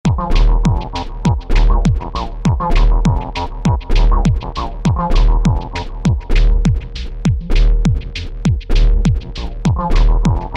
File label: Downtempo; Lange Donnerstage Cao Fei